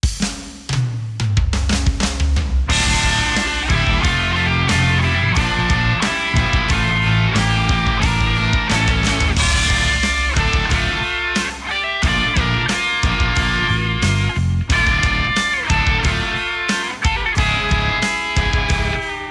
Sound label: Match Loss; mario strikers football battle league; Against - Mario